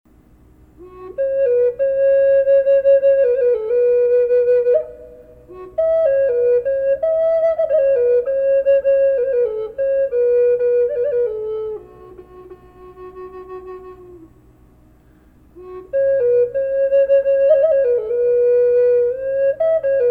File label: ambrosia maple reverb howling wolf, flute; collector flutes